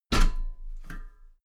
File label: step metal ladder step top heavy; schritte